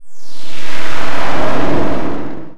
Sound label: syaa; ゆめ2っき